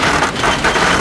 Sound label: Garage Door Slide; Max Payne